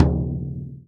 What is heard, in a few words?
BASS, INDIAN PERC